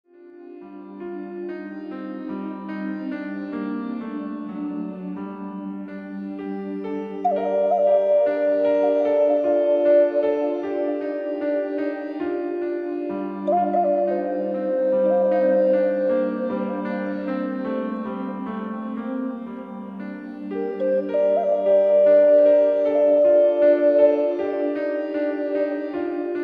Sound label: sample, indian flute